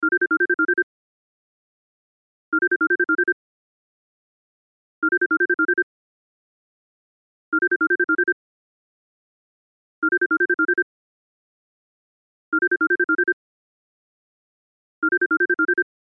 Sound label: Cell Ringtone; Loop; Cell Phones; Blastwave